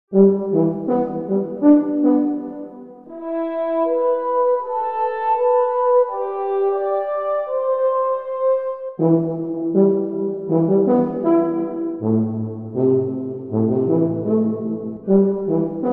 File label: Horn Tuba Fanfare